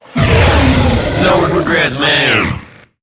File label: Infested Lich Drillbit Death